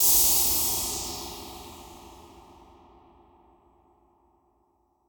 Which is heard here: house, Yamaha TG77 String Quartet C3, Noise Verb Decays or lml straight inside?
Noise Verb Decays